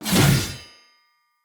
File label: Sphere Dual Sword Whoosh Heavy; Weapons; Player; Swords